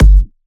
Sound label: 128BPM Kick; Vengeance Samples; Mars Kit